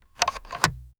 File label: realistic sound of liftin, telephone